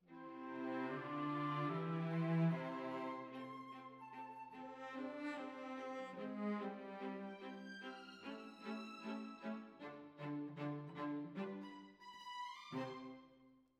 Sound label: Cellos Over